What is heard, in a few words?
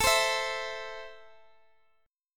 A Minor A down Guitar Open G